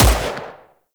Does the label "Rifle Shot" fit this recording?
Yes